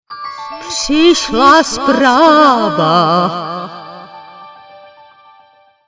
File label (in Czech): zpev